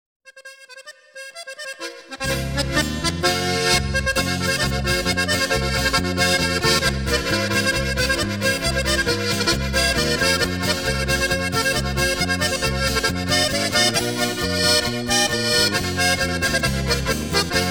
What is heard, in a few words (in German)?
Polka